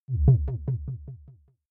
button echo wobble

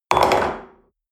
espresso upset table hard, restaurant cafe

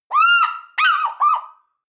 eagle african fish call

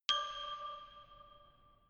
notification glockenspiel creepy single low

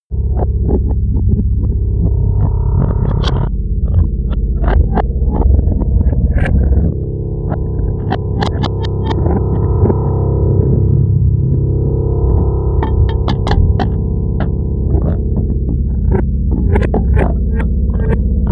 drone stretching

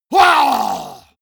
monster growl attack throaty; schreie

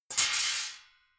metal sheet crash hard; metall

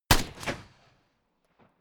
rifle ak-47 exterior fire single; comedy-cartoon; Pistols and guns; pistolen-gewehre

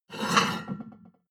plate on plate take slide medium

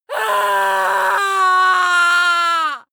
female scream horror; schreie; menschen

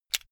car flip key insert hard

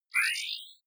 sci-fi menu open light

